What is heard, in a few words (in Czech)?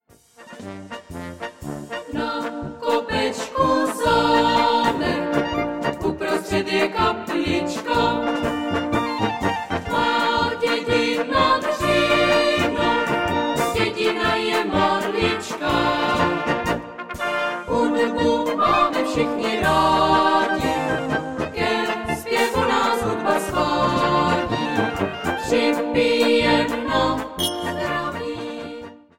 polka